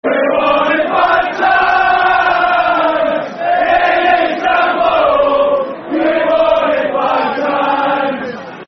Football Chants (Soccer Songs), Liverpool; We've Won It Five Times; Liverpool Lfc

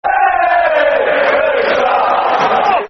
Football Chant (Soccer Song), Man United; Louis Saha

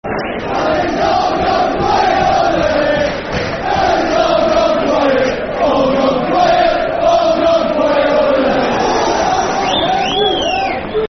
All Gone Quiet Over There, Football Chant; Bury; Bury Bfc

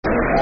Clap, Football Chant (Soccer Song); Bristol (Clap); Bristol City Bcfc

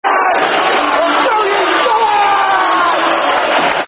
Excited Geordie, Football Chant (Soccer Song); Newcastle United Nufc

